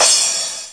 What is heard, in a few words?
909 Crash; DRUM MACHINES; 909 Extended